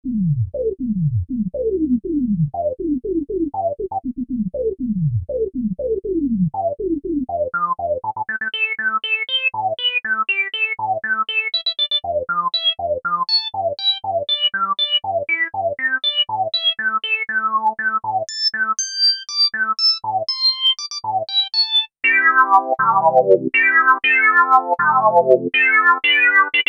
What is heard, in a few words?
ensoniq fizmo filter reslp q100